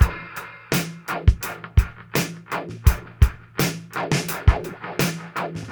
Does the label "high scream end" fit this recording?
No